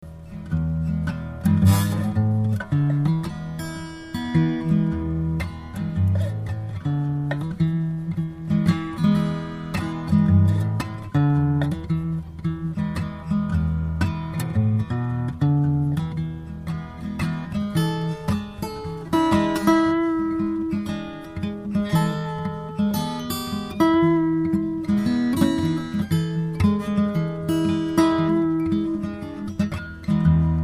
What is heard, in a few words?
Noodlin' Around, Guitar